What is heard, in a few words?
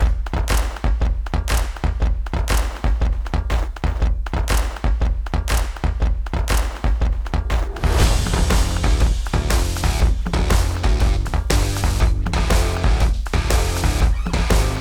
tap and twang, guitars; heartbeat guitars; sample logic